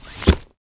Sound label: Item In, interface